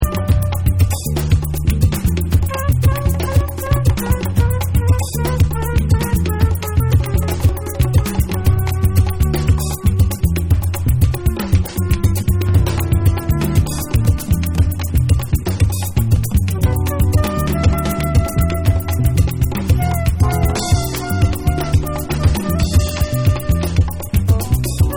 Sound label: Flute Dub